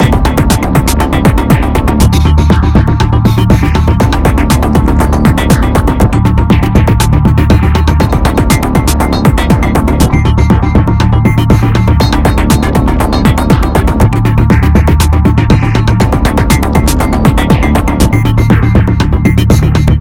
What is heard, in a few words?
music electro combat; synthetik